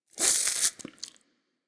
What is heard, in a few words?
Wheeze Close; Warframe; Infested Eris